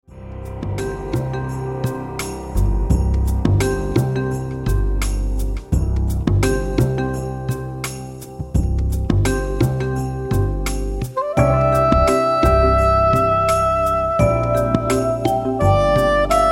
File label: christmas jazz classics; Weihnachtspraesente